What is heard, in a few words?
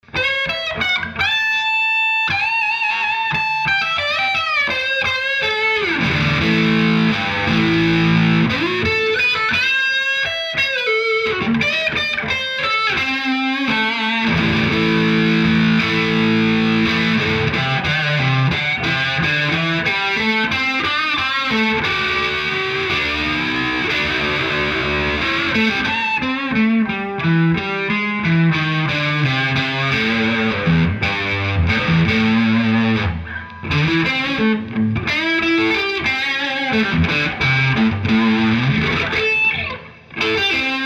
P-90 Dist